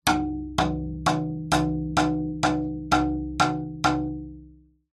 impacts glass large window hit with wood stick multiple